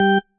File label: marimba tissue; ゆめ2っき